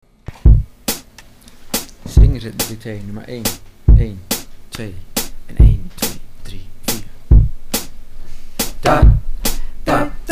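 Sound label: swing rhythm; dictee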